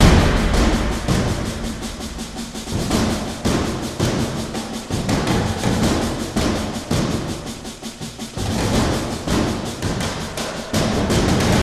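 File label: percussion loop short; transformers war for cybertron gamerip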